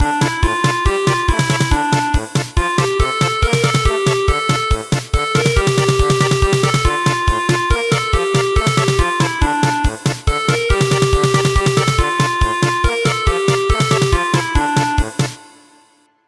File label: German Polka; A new joy has been revealed